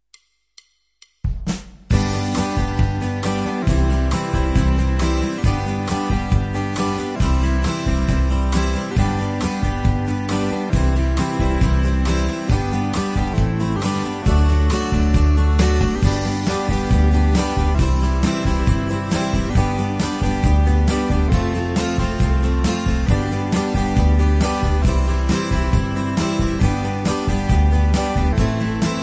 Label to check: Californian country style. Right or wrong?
Right